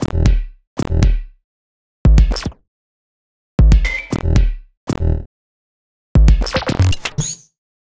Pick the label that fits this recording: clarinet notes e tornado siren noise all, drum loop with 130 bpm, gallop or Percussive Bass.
Percussive Bass